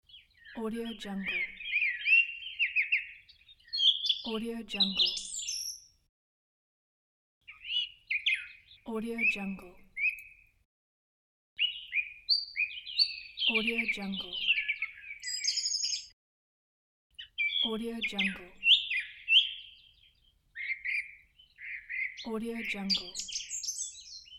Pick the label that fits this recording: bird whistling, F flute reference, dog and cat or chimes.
bird whistling